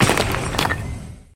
casket bomb

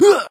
Scream

